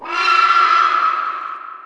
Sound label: gryphon die